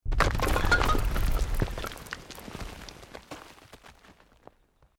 ROCK DIRT SLIDE SMALL; Created dramatic effects of buildings collapsing or breaking; Rock Sound Effects; Materials

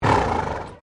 Wagon Horsedrawn 4 Horse Snorting; Horse Sound Effects; Animals

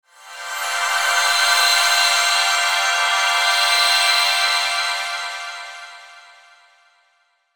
Pixie Dust Shimmer Sweeper Swirl Crystal Ring; Magic; Blastwave

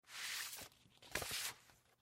Chart Folder Movement; Hospital; Emergency

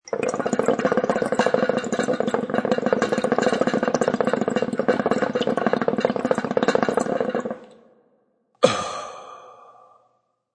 Hookah Exhale; Ringtone

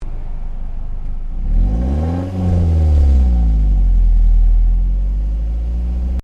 Car Ford Focus Idle Rev Exhaust; Ford Focus hire car; Cars (Sound Effects:Vehicles)

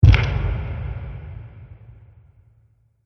Monster Footstep; Monster Sound Effects; horror

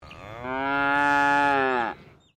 ANIMAL COW FRIESIAN CALF MOO; Farm Cow; Animals

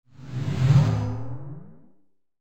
Trash Can Whoosh

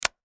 Cell Close, Cell Telephone Flip Close; Flip Top; Technology; Cell Phones; Blastwave

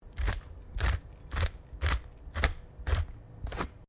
PEPPER GRINDER OPERATE; Various kitchen appliances; Kitchen Sound Effects; Kitchen (Sound Effects:Household)

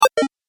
Game Pause, video game

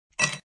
Ice Cb Thrw One, Ice cube thrown in glass.; Drink Mixing (Sound Effects:Bars)

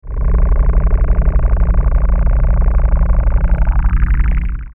Ascend Deep Pulse Warble; Production Element Imaging Element Accent Transition; Ascending

